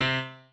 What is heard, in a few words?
piano